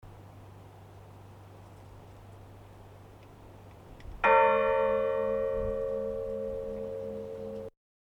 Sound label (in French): cloche; Pindray